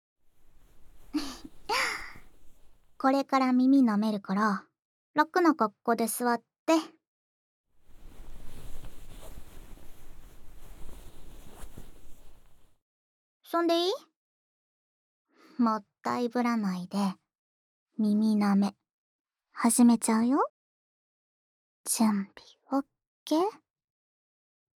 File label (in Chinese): 用舌头品味一番的舔耳（左右耳）, ASMR